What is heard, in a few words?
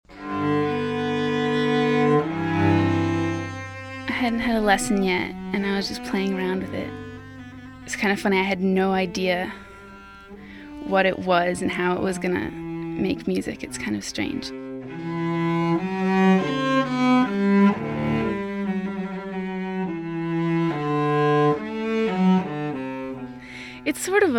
cello